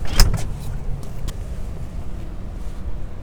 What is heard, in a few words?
loop, techno atmos